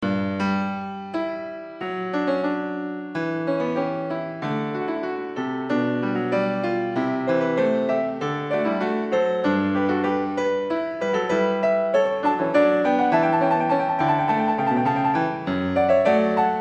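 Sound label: Goldberg Variations, Alla breve, piano; Bach; 1982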